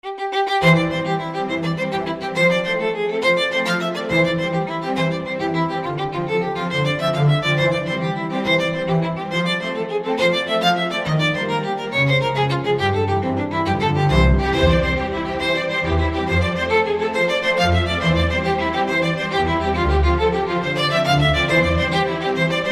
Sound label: Joyful Jig